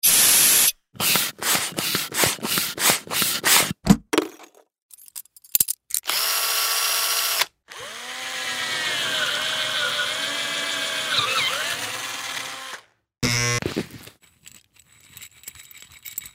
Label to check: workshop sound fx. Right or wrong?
Right